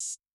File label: Open, HiHat; Yamaha DTXpress-II